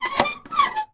metal handle movement squeak rusty old; zapsplat

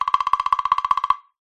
cartoon run fast; zapsplat

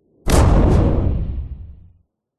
impacts metal heavy slam wallop

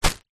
plastic bag containing clothing catch; foley

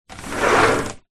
household screen door insect slide

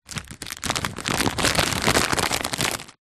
food potato chip packet rustle movement; zapsplat

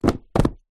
household books small pile down on table

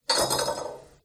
toilet handle; fox audio

